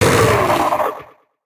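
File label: butchered charge attack; En butchered charge atk; Fight Knight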